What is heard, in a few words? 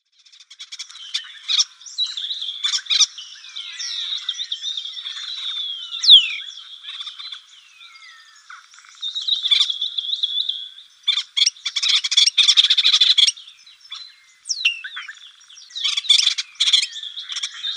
Spotless Starling calls